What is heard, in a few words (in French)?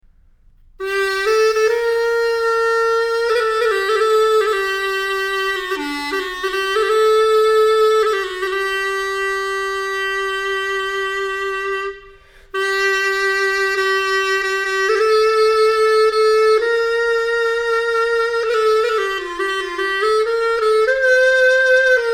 chalumeau Improvisation III